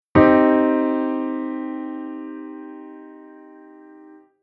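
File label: Chord Minor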